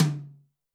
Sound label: tom, Zoom MRT-3B